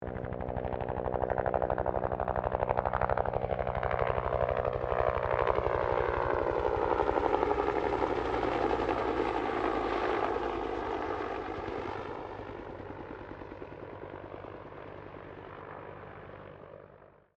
HELICOPTER 1967 BELL UH1 IROQUIOS PASS DISTANT; RAN UH-1 Iroguios; Helicopters (Sound Effects:Vehicles)

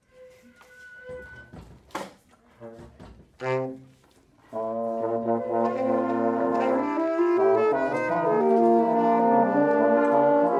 Music Class Band Warm Up; Orchestral; Musical

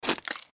Mud Movement, Mud Suction Wet Moist Juicy; Squishes; Foley; Sound Effects:Objects; SoundEffects-Blastwave

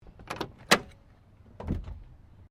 CAR HOLDEN FE DOOR OPEN, Classic Australian cars; Car Sound Effects; Cars (Sound Effects:Vehicles)

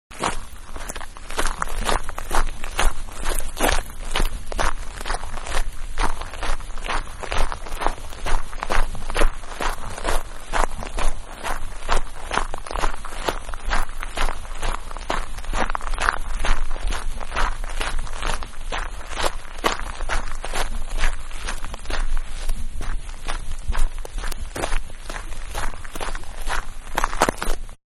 Walking-On Gravel Medium Speed; Footsteps; Human; Footstep Sound Effects

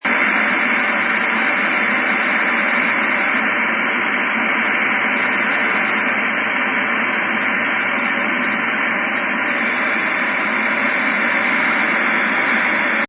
TV Static, Static from a television; Televisions (Sound Effects:Electronics)

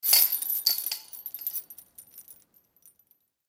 Chains Fence Shake Pad Lock Impact; Shaking (Sound Effects:Objects); Blastwave; Foley

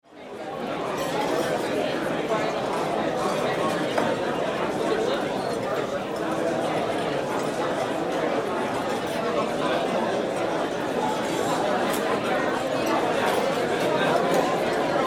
Ambience Restaurant Diner Busy Interior; Restaurant Sound Effects; SFX Bible 6

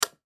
Switch Off Device Light Wall; Switches; Foley; Objects; Blastwave

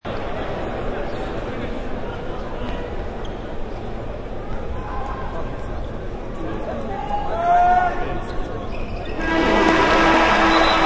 Arena crowd cheers after foul shot; Part of Arenas (Sound Effects:Backgrounds)

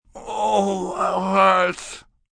Moan Oh It Hurts, Moan, groan, male; Groaning; VoiceBox

